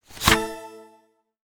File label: U I New Hat